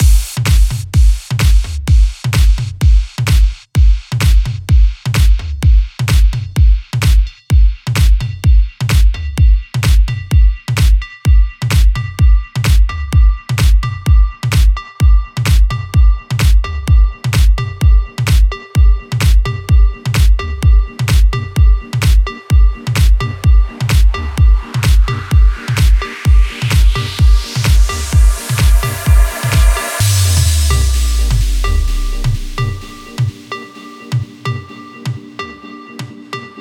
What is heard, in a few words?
SAX EXTENDED MIX